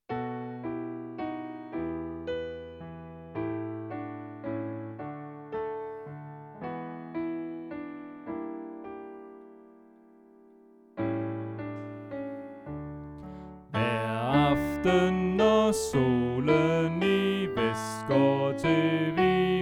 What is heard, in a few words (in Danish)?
Sopran; Kys mig godnat; oevefiler